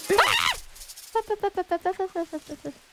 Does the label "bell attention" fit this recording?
No